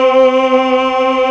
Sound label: TRON MALES; E-MU Formula 4000 Series Vol. 3; Analog Odyssey; VK Instruments